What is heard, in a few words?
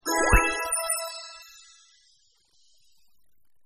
expert score reached; Delicious Emilys Wonder Wedding